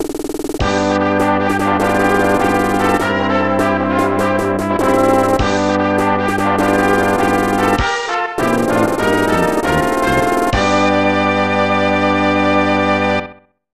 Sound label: Race Start Fanfare